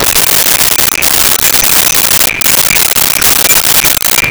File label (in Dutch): roeren; Home-made